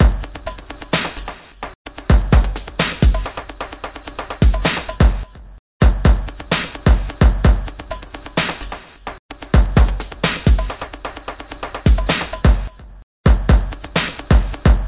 129 stuttery electro real, drum loops and breaks

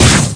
Clicky Gadget Things

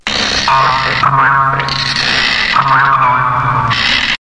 cybersqueaks; Weird and Interesting Hits; single hits

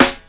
snare

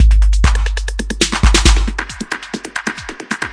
136 808 all sixteenths, drum loops and breaks

